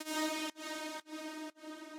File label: Lead Hit, Dancefloor Pop; Remixlive